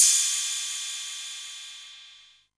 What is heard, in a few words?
808 Cymbal